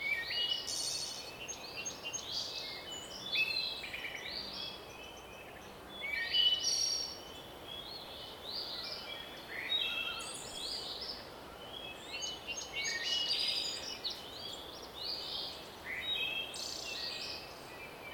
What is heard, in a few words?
birds; Golden Crayon Sounds